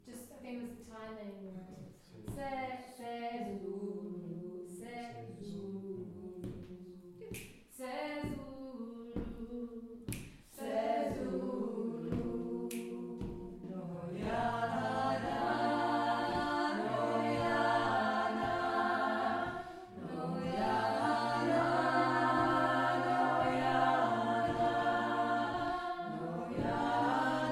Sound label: Chant Workshop; Noh Yah Nah